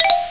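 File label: sound Message Sent; NATICQ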